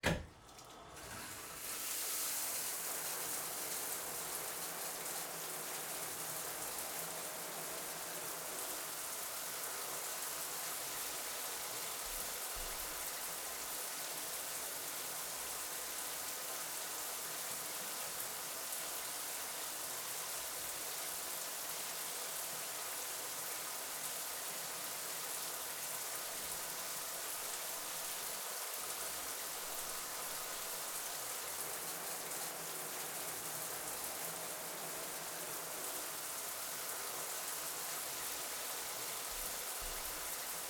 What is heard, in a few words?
Shower; Gage Freeman; Bathroom Sounds